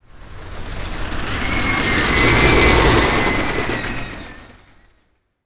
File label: bg dist rollercoaster by; fun park; Tony Hawk's Project 8; XBOX